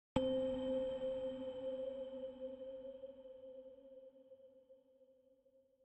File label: Desert constellation Note Solved C3; Desert SFX